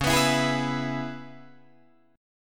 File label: C sharp Minor 7th, Guitar Open D